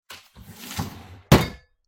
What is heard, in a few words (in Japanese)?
シンクの下の引き出しを開ける音